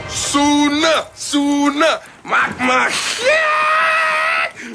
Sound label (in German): Jingels